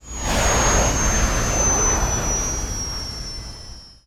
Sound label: vehicles ghost out; ghost left